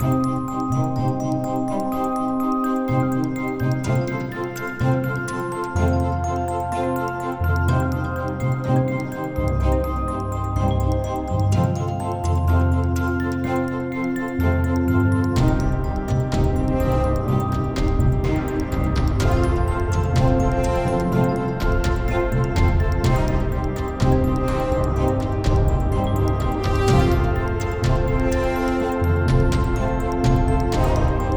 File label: Mystical Adventure